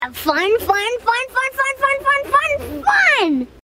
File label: kazoo; meme